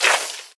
Skeleton Attack

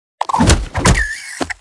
Enemy Poult Attack

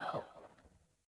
Death

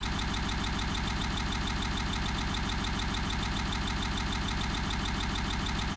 Armadillo Roll Loop

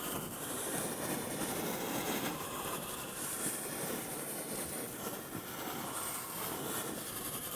Blackboard Write-On Loop

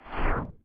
Obstacle Cloud; In Obstacle Cloud

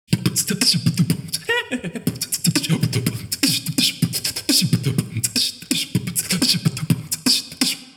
beatbox 16 beats; guard1 jungle

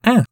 chorus hurt

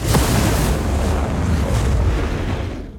Finalboss Wave fly

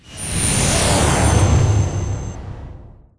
Music Frontend

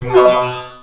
Male Hurt Robot; Mars Sortie

